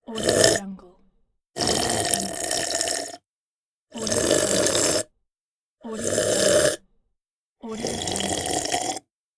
sip coffee

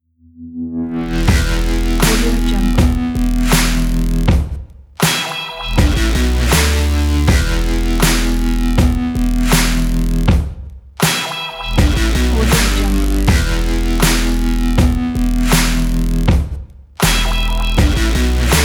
indie rock

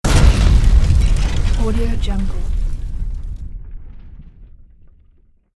detonation

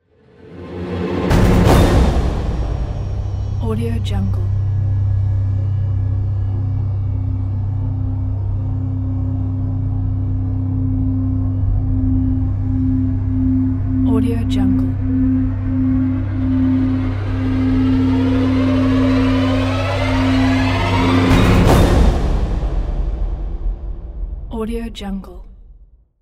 horror intro; commercialmusic

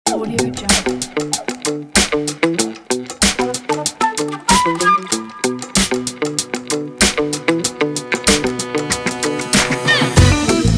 back for funk

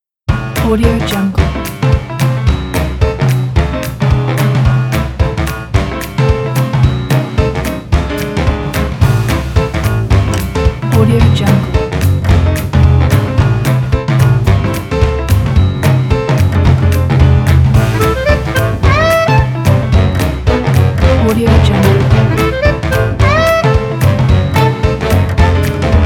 a funny jazz

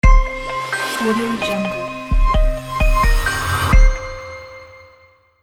liquid motion logo

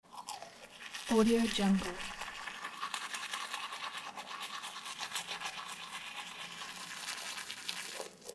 brushing teeth

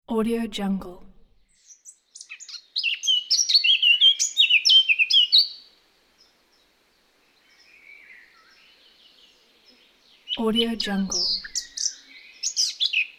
bird in forest

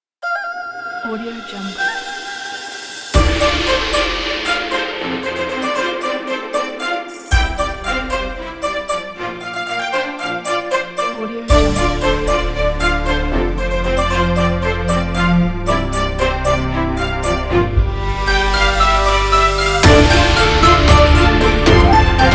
majestic china japan korea; octahedronmusic

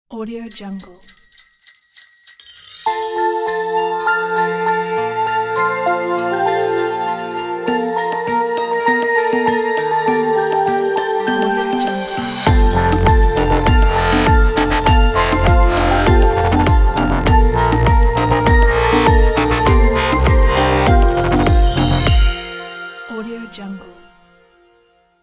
christmas jingle bells modern logo

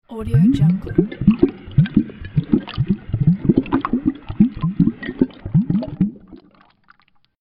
underwater bubbles

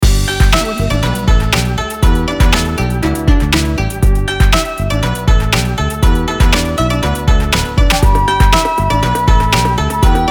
happy chinese time

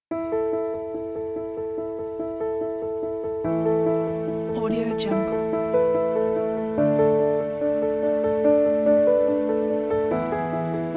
beautiful piano

